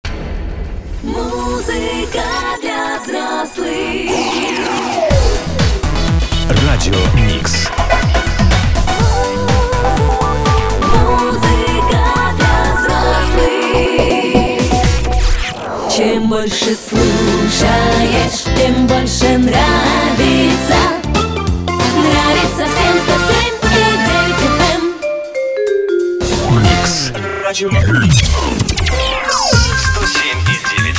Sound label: Jingles Radio Mix; efir